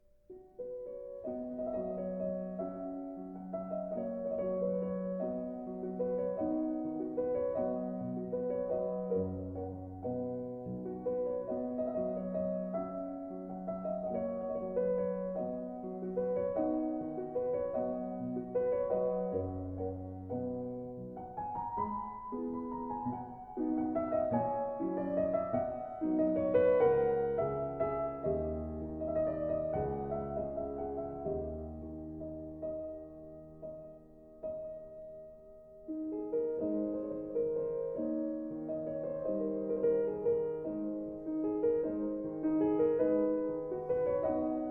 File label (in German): Schubert G Dur op.78